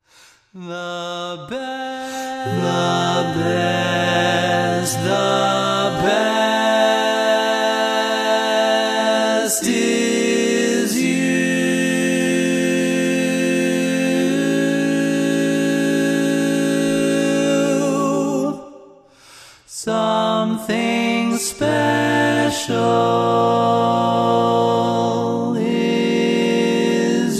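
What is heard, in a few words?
barbershop tags, baritone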